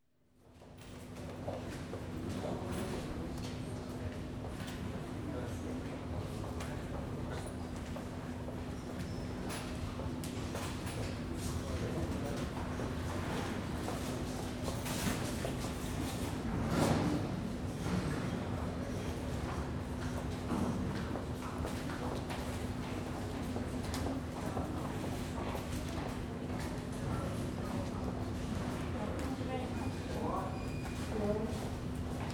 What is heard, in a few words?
Pharmacy Waiting Area, Hospitals; BBC Sound Effects Library